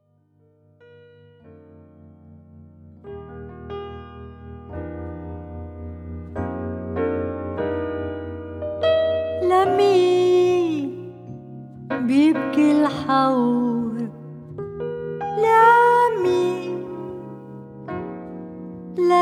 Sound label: Lameen Piano Version